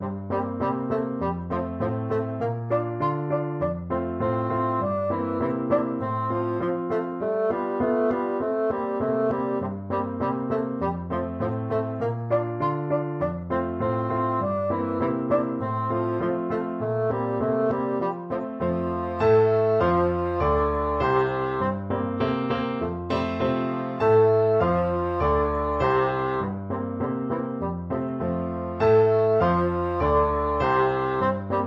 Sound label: offenbach can can, bassoon